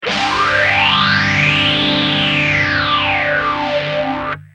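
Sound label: guitar, Mutron phasor II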